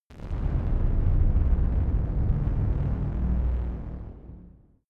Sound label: heavy hell door